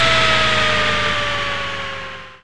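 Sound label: table saw; REDNECK; RAMPAGER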